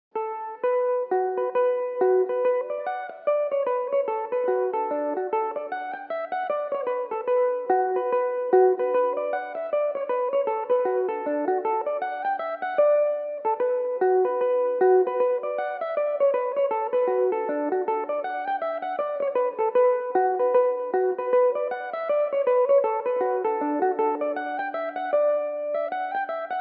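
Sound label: reel D major belmuse, irish mandolin; Hollings' Reel; Belmuse EM-190 electric mandolin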